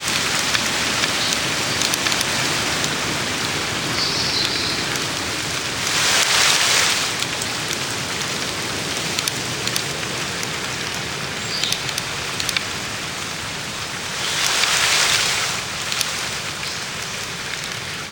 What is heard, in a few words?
rainforest